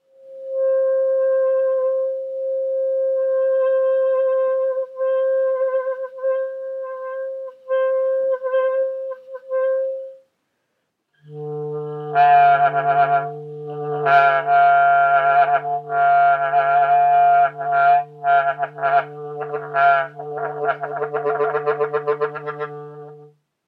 shaking the clarinet

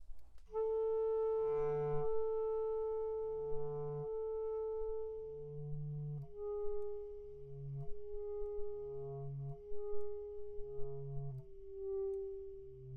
bass general examples

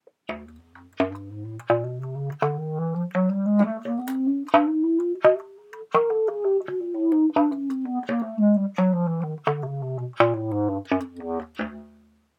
bass slaptongues within phrase

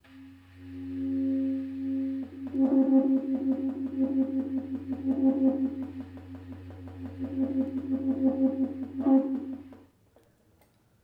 trill

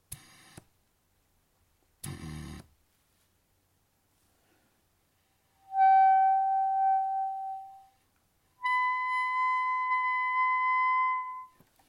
bass f sharp

